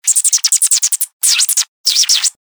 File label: creature cirps funny